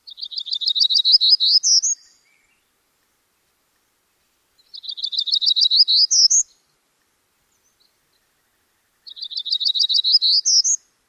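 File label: Townsend's warbler songs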